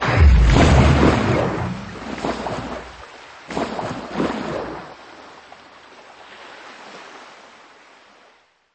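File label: something heavy fell into water with a splash and sank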